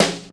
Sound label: BRUSH, JAZZ DRUMSET